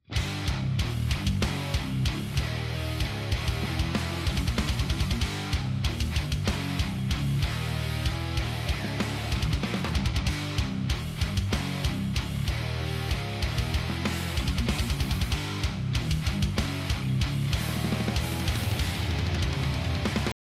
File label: git drum